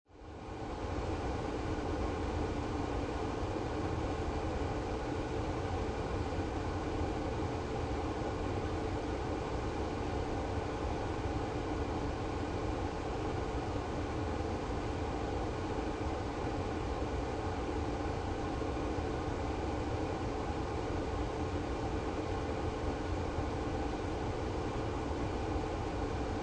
Car Interior AC Running Medium High Speed, Car Interior, 1996 Acura Integra, AC Or Heater Running At 03 Medium High Speed, No Engine; Transportation Automobiles